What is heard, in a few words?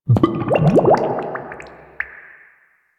Gulp; Entrati Labs; Cavia Rank Up Scenes; Fibonacci